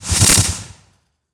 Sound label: Magic Wand Blast; Blastwave FX